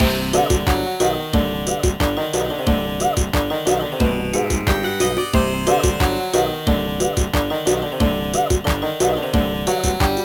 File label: Minigame BGM; Mezase Shoujo Mangaka Ciao Manga School DS gamerip 2006